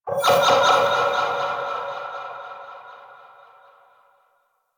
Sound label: Sonar Ping; Enemies; Abilities; Warframe; Corpus; Arachnoid Hunger